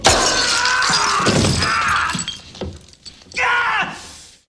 dying

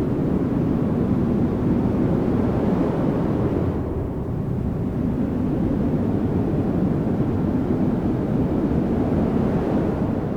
outside loop; ambient; morass beta

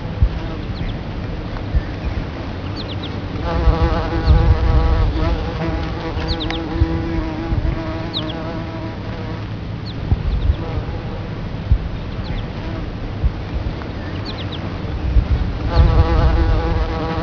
birds bees; industrywest

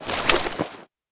colt draw, weapons; kuilu

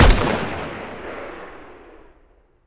five seven fire, weapons; kuilu

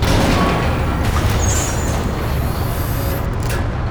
door

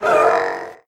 trooper pain

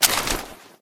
p228 draw; weapons; kuilu

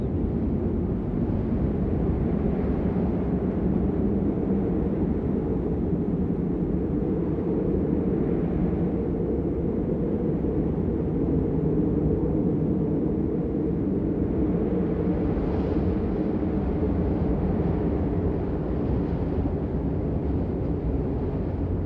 blizzard mono

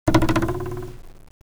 ruler; dm cardboard

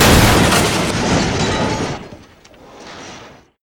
wall break

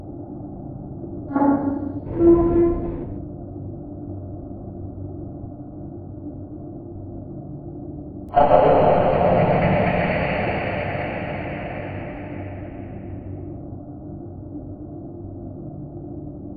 crane room loop; ambient; morass beta

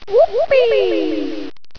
woupi; cs rats yo

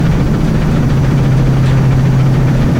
large fan loop; ambient; morass beta

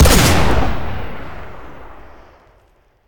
shotgun fire; weapons; kuilu

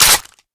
colt slide forward; weapons; kuilu

